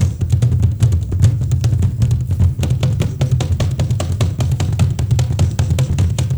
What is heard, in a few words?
ZULUS, ZULU DRUM; Spectrasonics; Heart of Africa 2